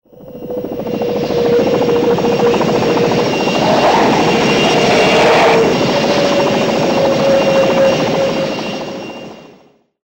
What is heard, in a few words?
Hover; Airwolf